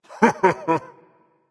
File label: heh heh berserker; cuchulainn